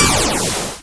Pulse Sound

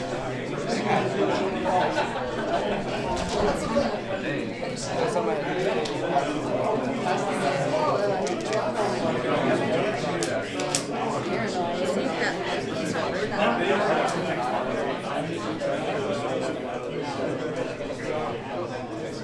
Group of college students in classroom

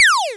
Retro Laser Shot

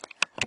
Camera Video Button Press

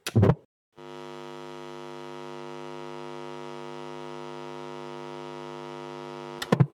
Amp On Off

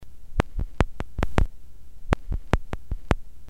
Click

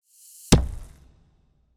Heavy Book Falling

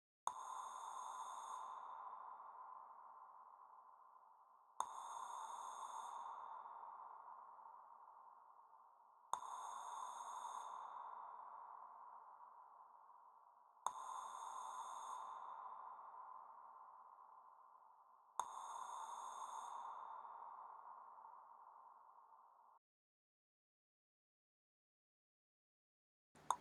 Breathe

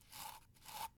Shave

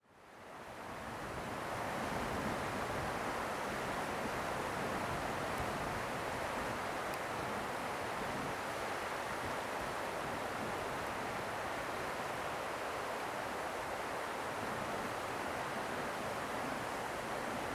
River Winter

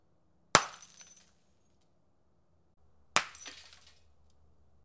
Glass Breaking Loud Glass Shattering

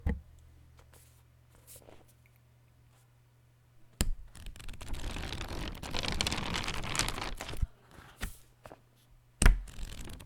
Flipping Pages Of A Book

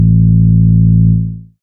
Bass

